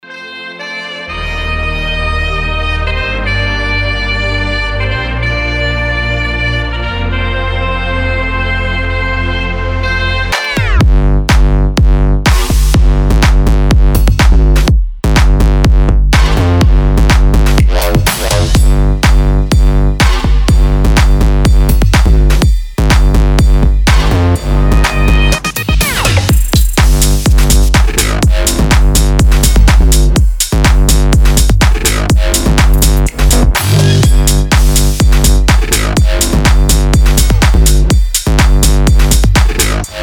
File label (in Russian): saksofon i basy